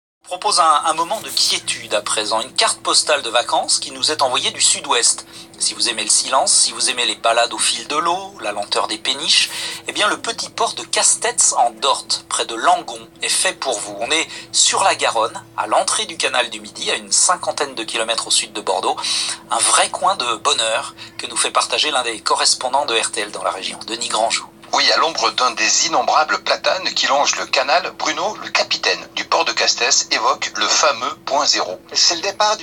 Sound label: Vocal